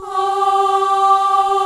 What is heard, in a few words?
MIXED CHORUS